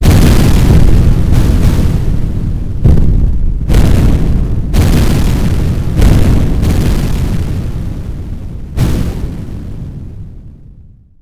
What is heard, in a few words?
explosions; arms